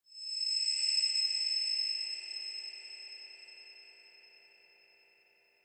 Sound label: high frequency eerie